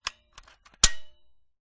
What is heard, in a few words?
office staple gun dry fire